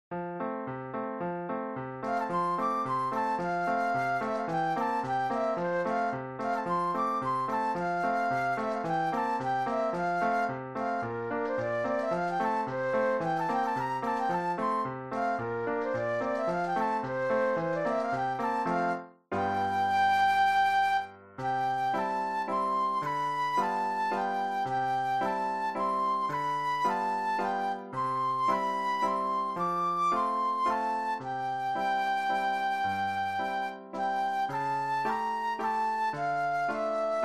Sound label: Flute Solo; classical